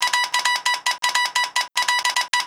Telegraph, iPod sounds; i OS

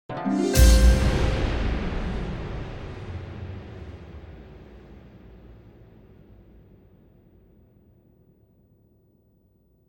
Set Safety Net; Millionaire Soundtrack; UK Revival (2018)

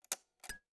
Windows Menu Command

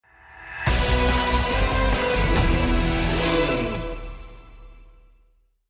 Commercial Out; Millionaire Soundtrack; Shuffle Soundtrack (2010 - 2017)

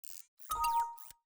Windows Error

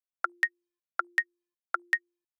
Navigation Right Turn Salient Haptic; i OS; iOS10; nano

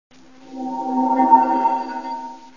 Ring Out; Windows; Whistler

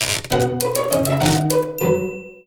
Typewriters, iPod sounds; i OS

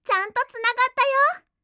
device connect; Windows; Madobe Ai

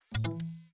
Windows Hardware Insert

